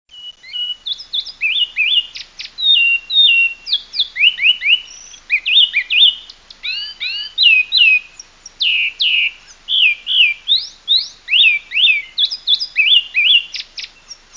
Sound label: thrush